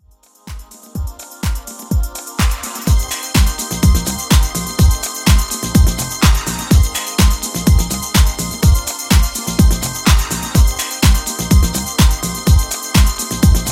Classic Redrum, 125 bpm

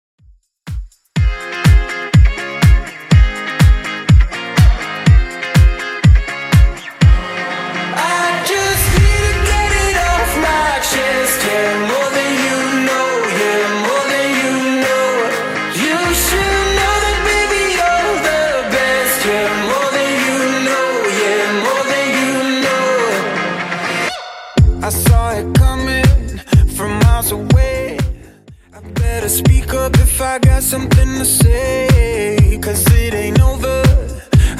Top40 Redrum 123 bpm; remixplanet

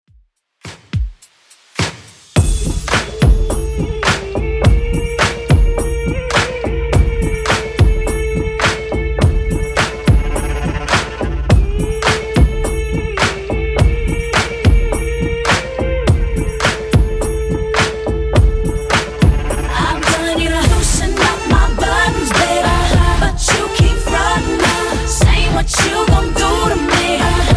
2ks Redrum 105bpm

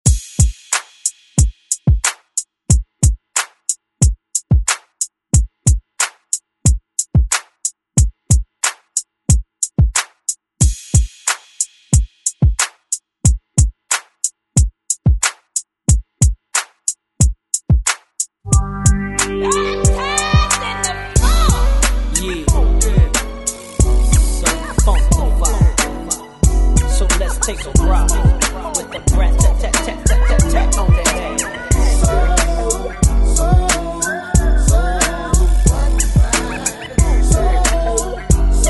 90s ReDrum; DJ Mhark

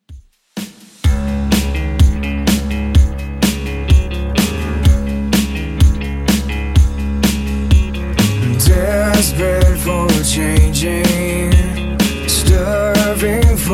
2ks Alternative Rock Redrum 125 bpm

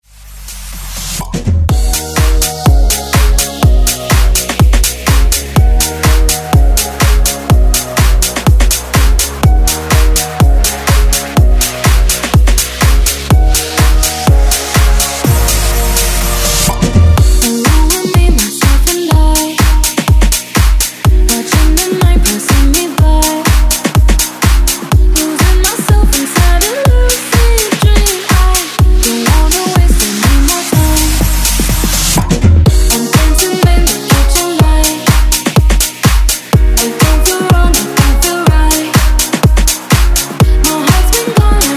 house redrum

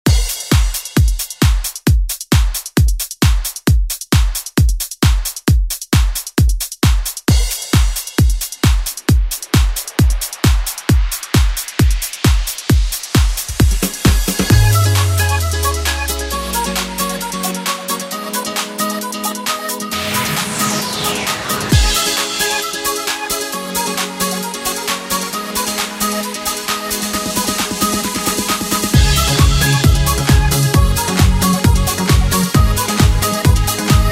Latin Dance Redrum; Deejay Hit

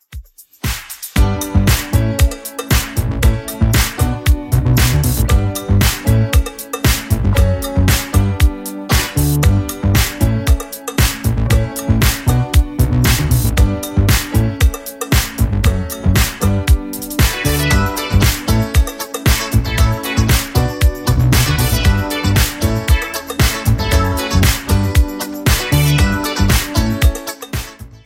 80s Redrum 116bpm

